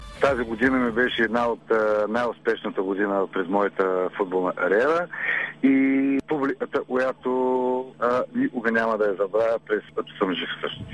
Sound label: gong